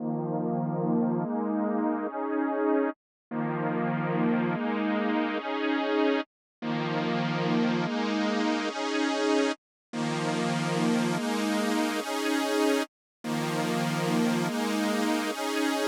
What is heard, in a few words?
145 BPM D# Min Saw; Stems; Cymatics; 2022 Melody Collection (BETA); Wavy; WTF